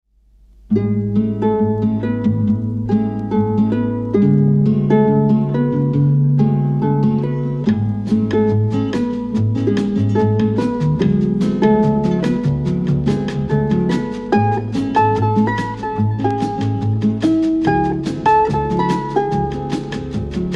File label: harp